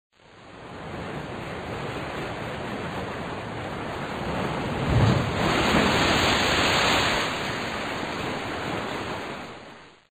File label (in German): Ozean; Natur